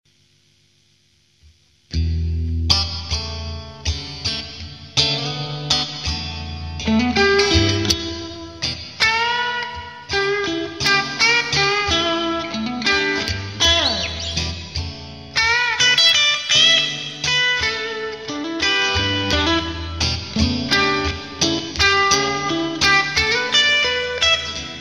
Bridge Marsh Stalone Push, strat66

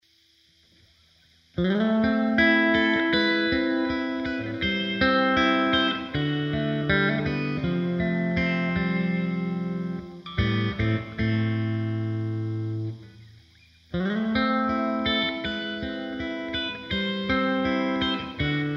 Bridge Fender Twin, humbucker

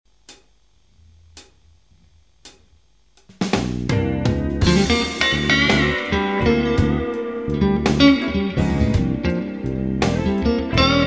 clean, strat vintage; Neck 3

